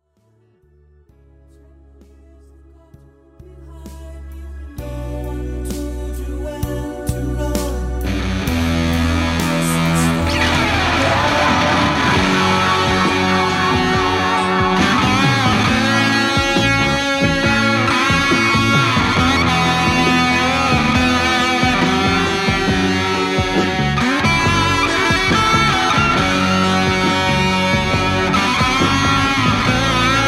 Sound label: Sunface Solo mix, Solo with Sunface, backing track; The drive is 100% and sundial is set at 0%. Fender strat with SSL-5 bringe pickup into Sunface, MXR Carbon Copy analog delay, and Fender Twin reverb.